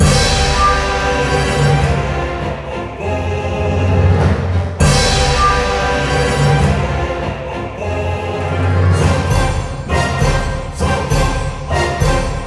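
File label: Boss Battle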